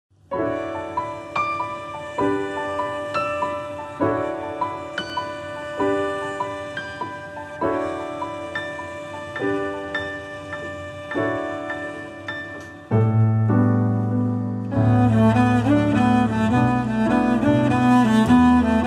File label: Five Pieces For Two Violins And Piano